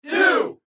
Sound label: Crowd